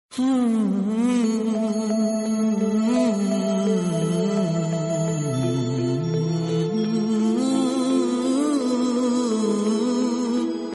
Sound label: Dhun Ringtone; Aamir Khan; Ghajini Movie